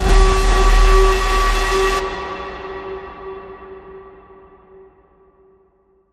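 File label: Alarm Ship Horn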